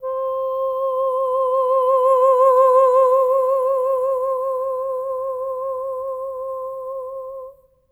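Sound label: Soprano Oh C5, Voices; Spectrasonics; Symphony of Voices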